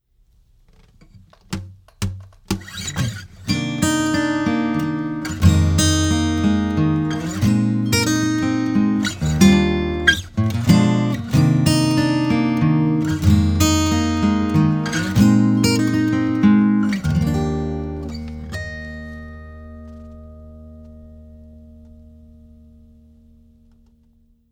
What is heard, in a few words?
acoustic guitar, eight; lct 441 flex; lewitt